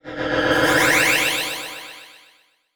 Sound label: Transition Delay Medium; Adobe; Production Element